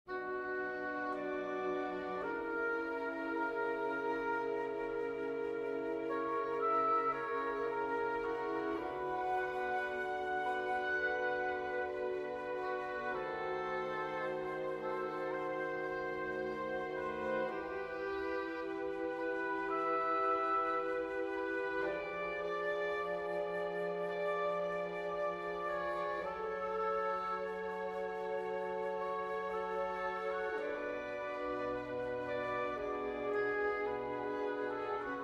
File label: for winds strings; haendel georg friedrich; hercules